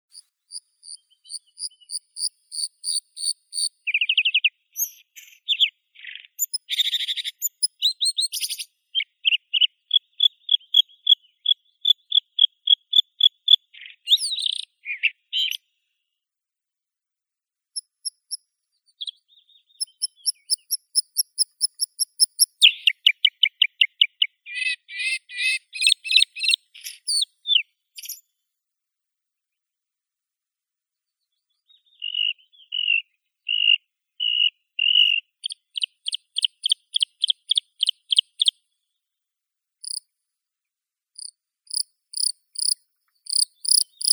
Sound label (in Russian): varakushka luscinia svecica, zvuki prirodi